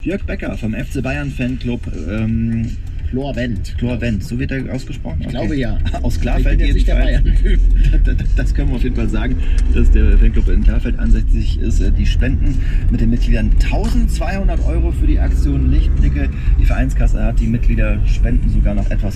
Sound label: radio